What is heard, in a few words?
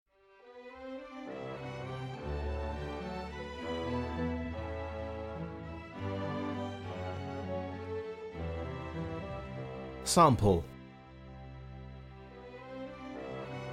classical